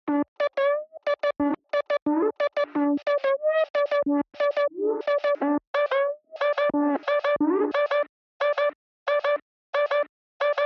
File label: synth